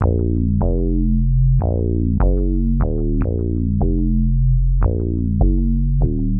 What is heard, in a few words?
Classic Bass, Drum N Bass